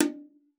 BONGO, BONGOS; Xsample VOL-9

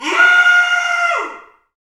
Bullhorn, Afro Horns, Africa

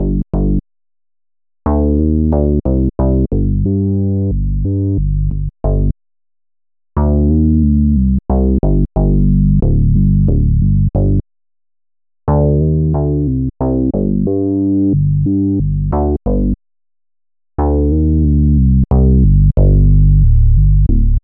Thick Bass; Sonic Foundry (Sony Creative Software) - Club Joints and Street Anthems; Time Traveller

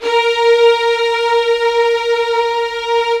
LDVLN1 A#4, LOUD VIOLINS; Q Up Arts; Denny Jaeger Master Violin Library

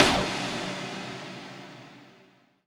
SD, Dance Drums

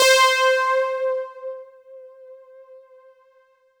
Roland JV 2080, interval; MG INTERVAL

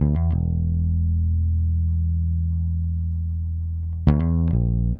Bass, 70s Analog Funk; Sonic Foundry (Sony Creative Software); What it Is!; Slinky's Place

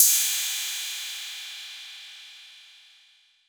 PERC 808; Wizoo - Platinum 24 Electronic; CHR PERC 808

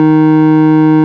ORG, Farfisa Clarinet

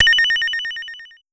Pollard Syndrum

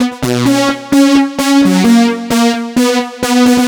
NRG Lead, trance

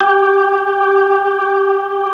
HAMMOND G4; Jazzistic

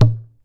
CALF HIDE, AFRICAN PERC; AKAI S6000 CD-ROM - WorldSounds

